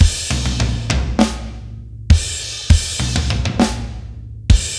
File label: Break Drums D